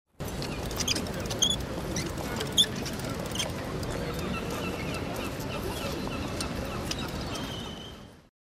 cabestrante

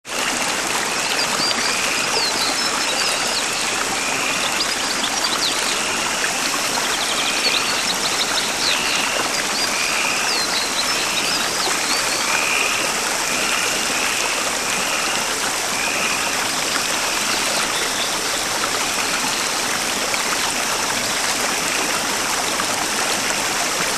ribeira río

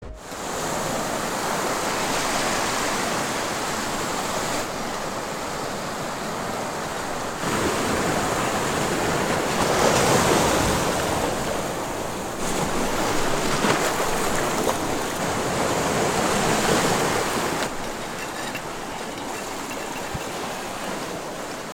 apañando percebes

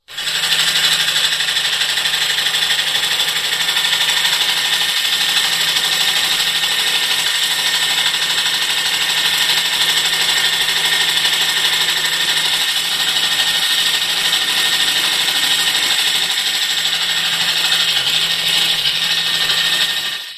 maquina trilladora

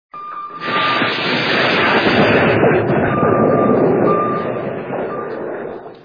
torpedo disparado submarino

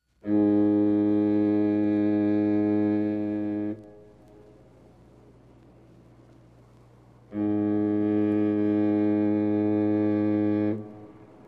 sirena barco

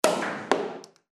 botella de champan abrir

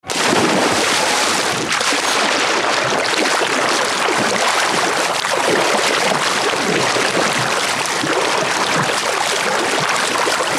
nadar

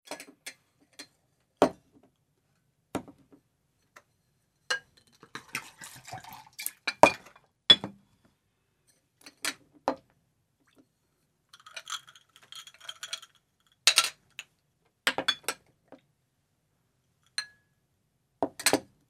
bar movimientos

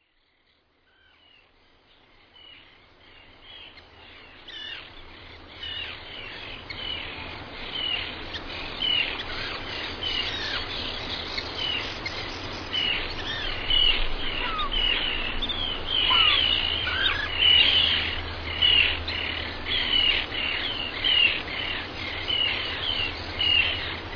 aves marinas

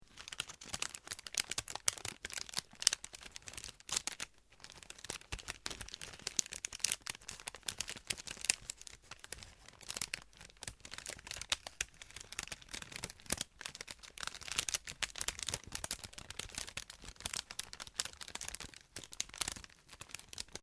hoguera

